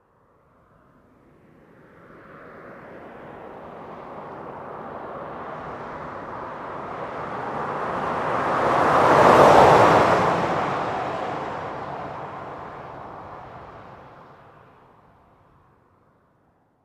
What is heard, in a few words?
Expedition 40 Mph By, ford expedition